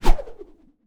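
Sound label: weapon cavalry javelin; Battle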